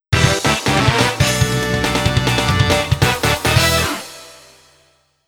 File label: Opening Jingle